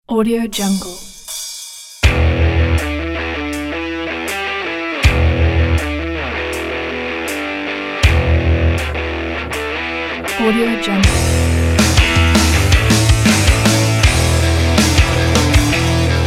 rock; rock and fight against violence

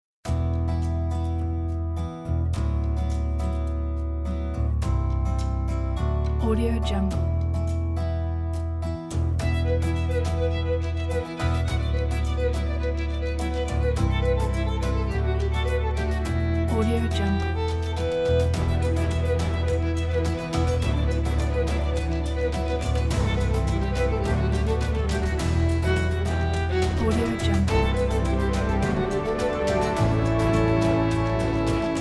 medieval pack

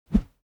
whoosh bamboo; foley; smartsoundfx

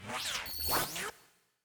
transitional confirms

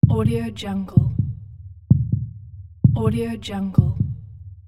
heart; soundsground

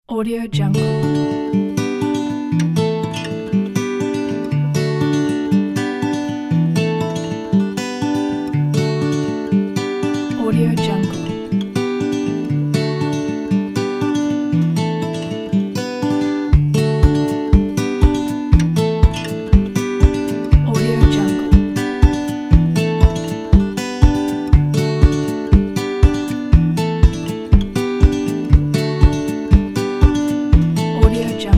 acoustic folky fun

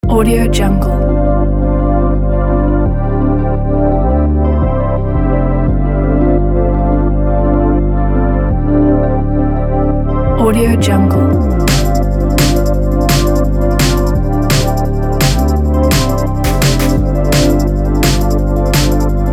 80s synthwave

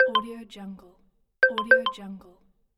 the xylophone message

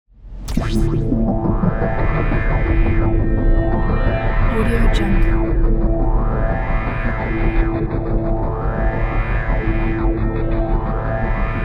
intense moment

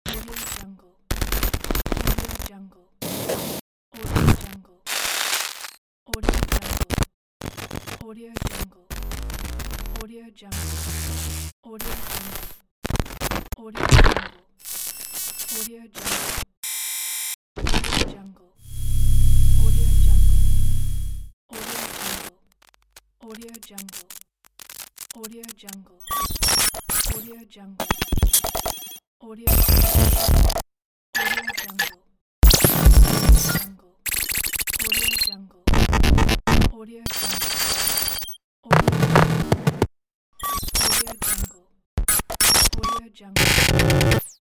glitch

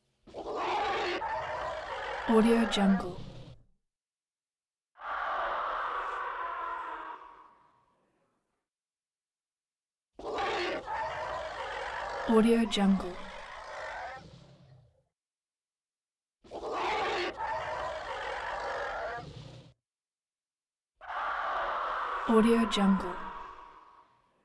animal screeches somewhat reverberant growly

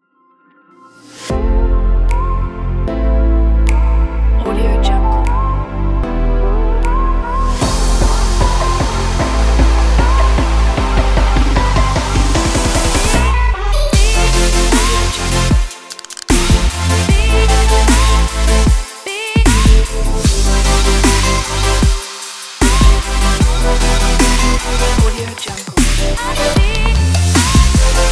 future bass

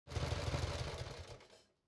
transportation motorcycle harley engine shut off

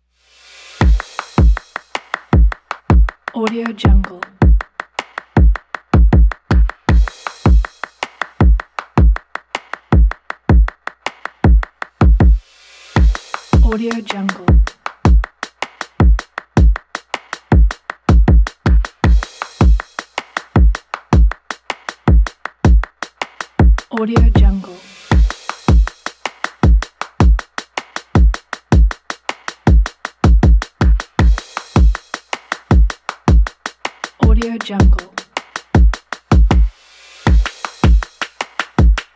claps logo

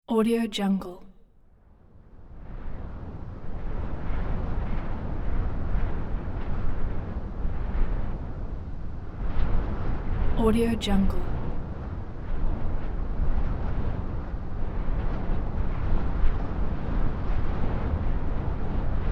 gusty wind

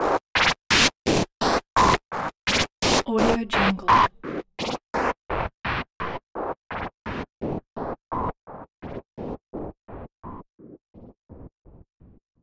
rhythmic chopped 85bpm; sinematic 2; ir special; soundmorph

